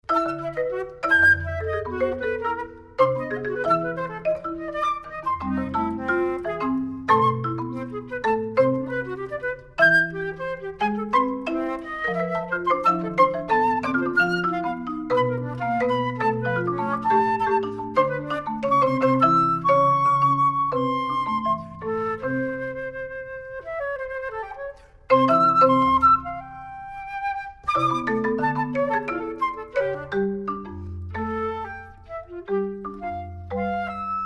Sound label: bass; Jetstream t bass